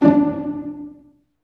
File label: STR SEC, STRINGS; Roland - LA Composer vol. 1